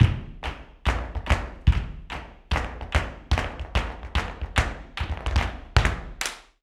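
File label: percussion, FARRUCA TAP, Flamenco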